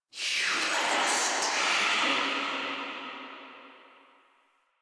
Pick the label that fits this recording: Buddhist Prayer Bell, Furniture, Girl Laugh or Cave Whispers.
Cave Whispers